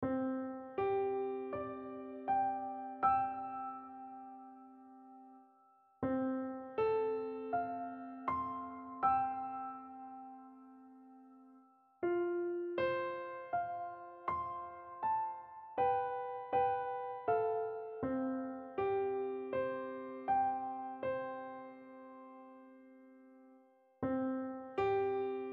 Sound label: Solo Piano